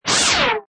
Distortion Blurp Sweeper Scrape Fast, Science Fiction Sound Effects; Scifi Production Elements, Set 2; Lynne Music